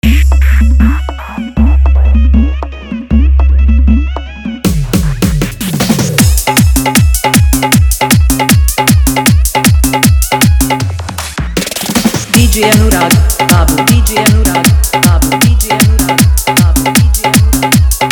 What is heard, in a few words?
Holi Dhollki Bass Mix